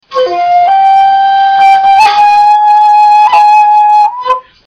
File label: Shakuhachi